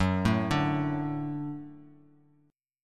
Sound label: Gb Minor Sharp 5th, down; Gbm#5; arpeggiated; JGuitar